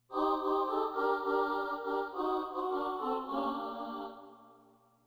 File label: synth